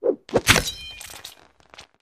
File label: Beheading Axe, whooshes & swishes